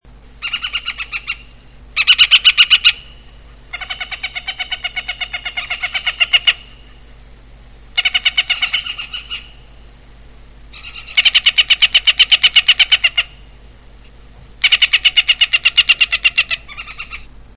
błotniaki zbożowe

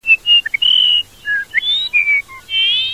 skowronek sierpodzioby - Chersophilus duponti; skowronki; wroblowe; dotychczas w Polsce nieobserwowany

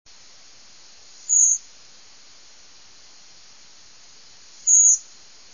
droździk - nawoływanie; wroblowe; turdidae; turdus; Droździki są pod ochroną.

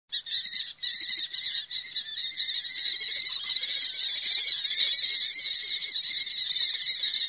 Kobczyk amurski - Falco amurensis

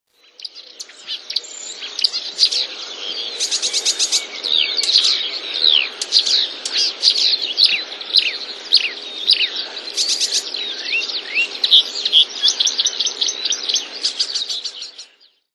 lozowka, głos modraszki naśladowany przez łozówkę; wroblowe; zapozyczenia

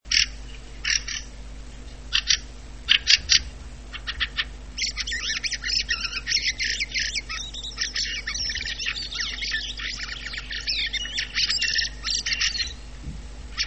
kwiczoł - śpiew; turdus pilaris; wroblowe; turdidae

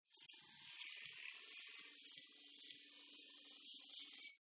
junko - nawoływanie w locie

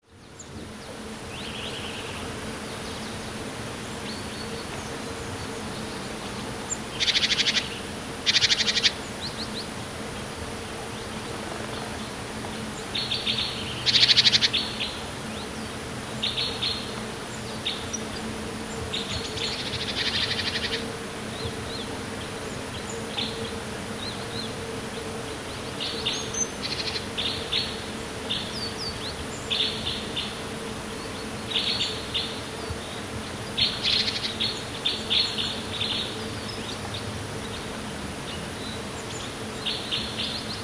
kowalik tryle, 3 szybkie tryle kowalika; To już jest prawdziwy śpiew, a nie tylko szczebiot

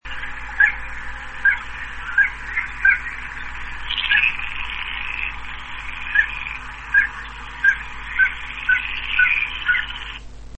zielonka - nawoływanie

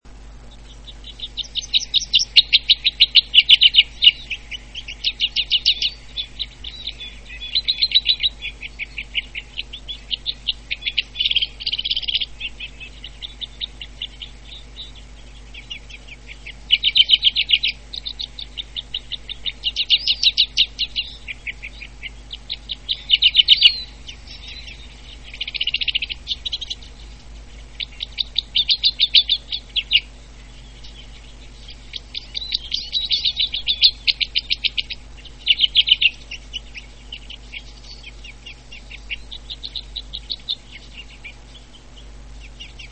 krzyżodziób modrzewiowy - śpiew

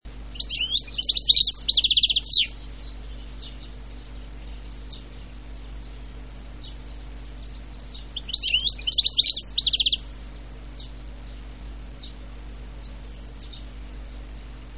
szczygieł na tle innych ptaków; jeszcze inny śpiew szczygła

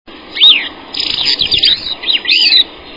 drozdek szarolicy - Catharus minimus; ptak dotychczas w Polsce nieobserwowany; wroblowe; turdidae

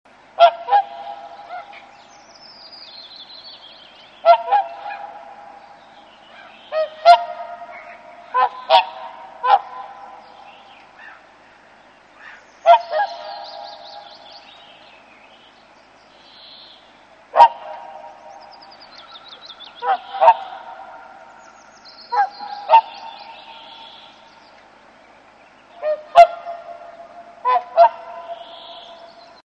łabędź krzykliwy - głos

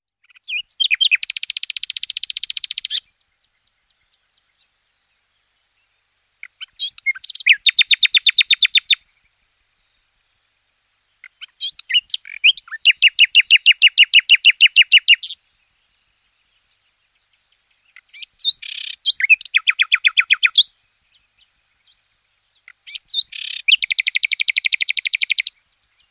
słowik rdzawy - koncert; wroblowe; turdidae; luscinia

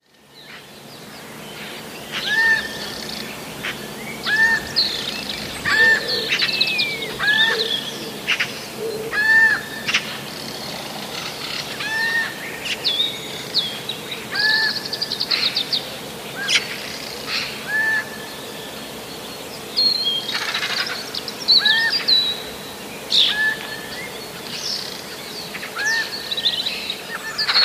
okrzyki sojki, Sójka; miło brzmiące okrzyki

